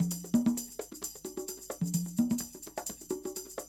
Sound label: perc, 130, Percussion; Big Fish Audio - DOM